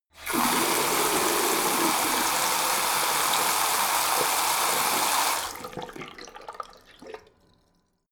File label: tap flow hard short